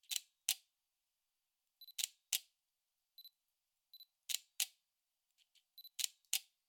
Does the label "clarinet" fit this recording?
No